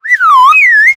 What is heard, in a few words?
fade out whistle